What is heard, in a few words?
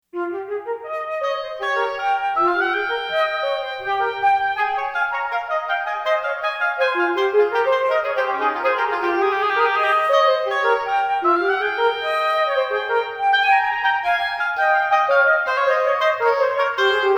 Duo Flute Oboe